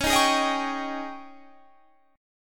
Ab Major 7th Suspended 4th Db

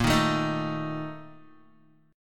Bb Major 7th Suspended 4th, Guitar

